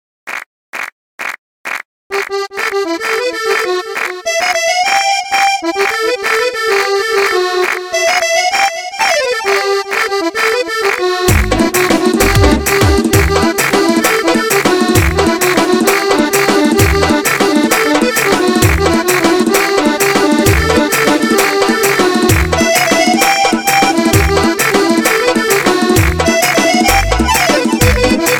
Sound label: keyboard instrument